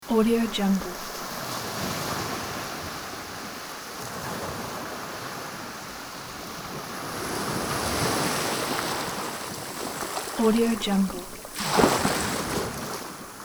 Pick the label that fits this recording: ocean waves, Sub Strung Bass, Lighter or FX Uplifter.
ocean waves